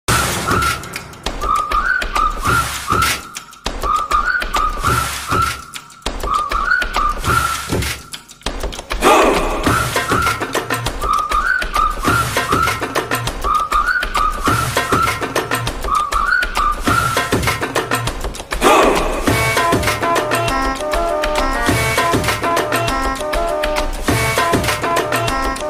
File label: movie ringtone bass bgm; dhoom